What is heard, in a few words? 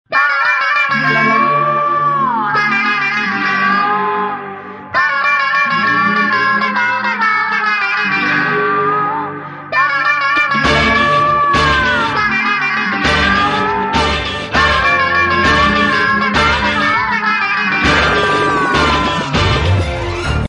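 Title Track background music Telugu Ringtone; Gang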